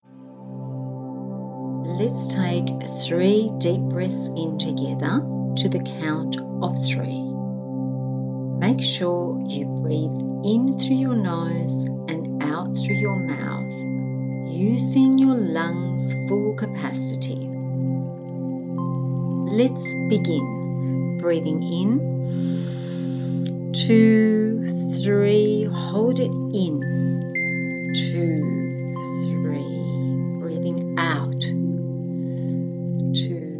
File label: Controlled Breathing with Soundtrack